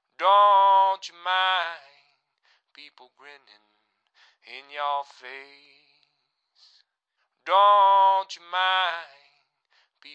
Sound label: 132 vocal oldradio; Blues